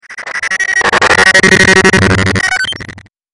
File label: noise interference